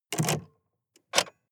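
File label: sports car interior key pull out; verkehr transport; autos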